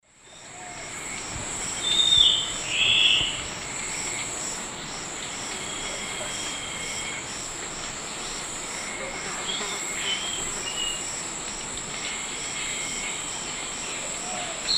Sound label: Phlegopsis nigromaculata, song; S. America; Eastern Lowlands